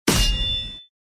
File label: kill; tacz; CIBR GunsPack v0.1